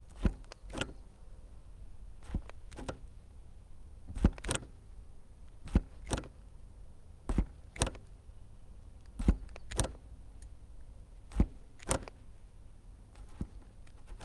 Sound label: suzuki drz400 clutch; Sonniss GDC